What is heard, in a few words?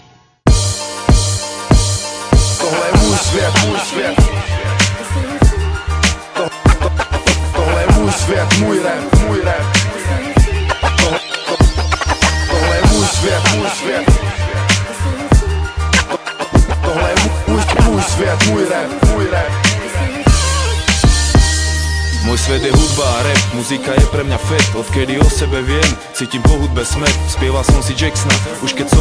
Hip Hop Beat